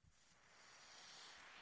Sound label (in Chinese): 特有的口哨烟花爆竹声